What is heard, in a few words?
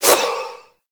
Proj Throw; Hoplite Army Replicant; Warframe; Warframes